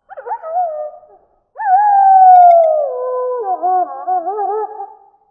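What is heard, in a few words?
animal wolf distant; modern warfare 2; amb elements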